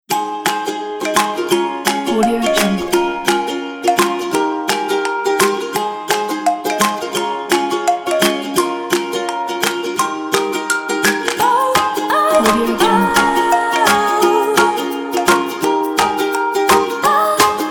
light cheerful kids pack

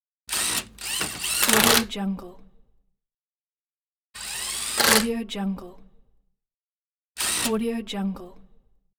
auto repair shop; moonaudioproduction

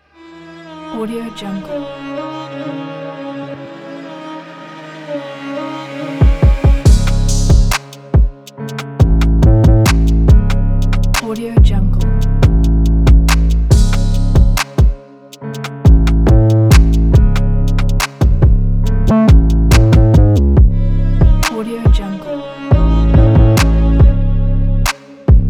dark hip hop; steve5ive

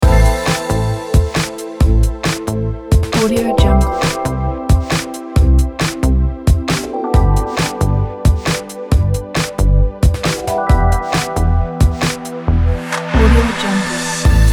background electronic loop

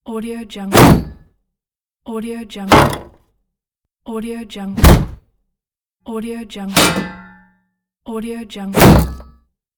shield

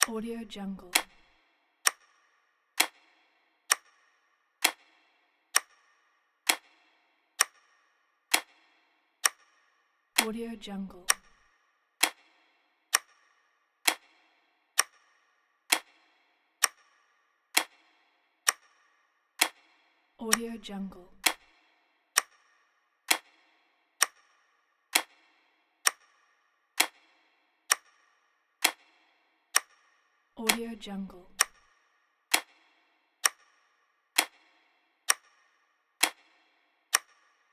clock ticking

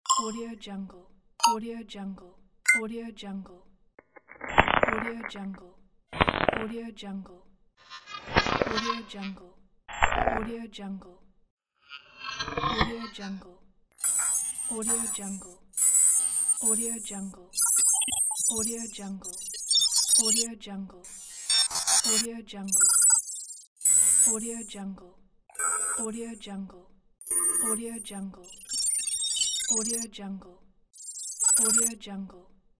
interface; volkovsound

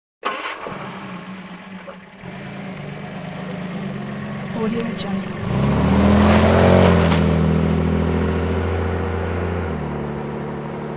sports car starts with gear crunch; hollywoodedge

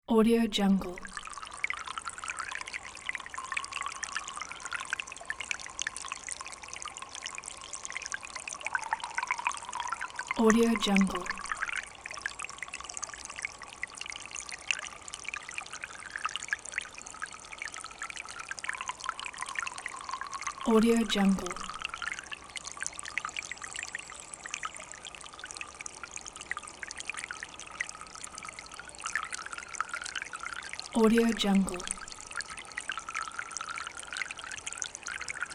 little forest creek

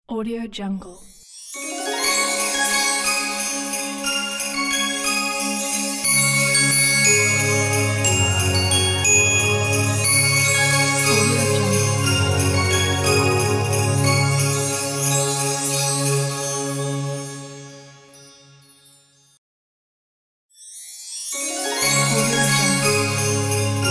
christmas logo

